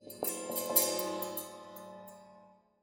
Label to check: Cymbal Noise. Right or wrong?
Right